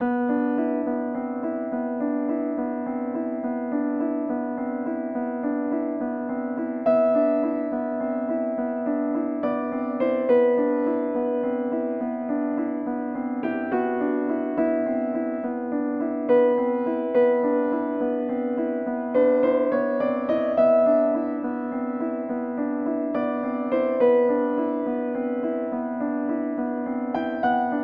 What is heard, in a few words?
Mode32 Loop